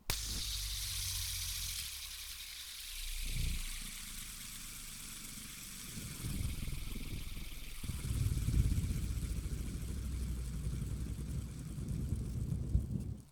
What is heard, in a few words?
spinner clean spinning